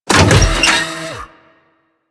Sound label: Thumper Bot Leg Up B; Warframe; Grineer; Legs Up